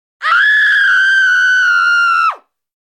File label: HUMAN, SCREAM - SCREAM - FEMALE, HORROR; Sound Ideas